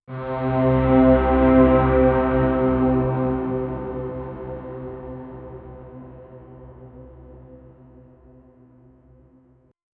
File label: gazing eye holy orchestra